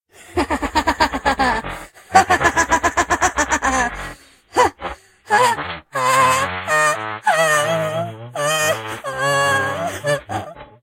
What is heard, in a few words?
laughs; Nemira; SyrinScape; Iris hill manor